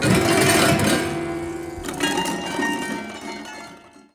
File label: Prepared Piano; Battery 3 Sample Kits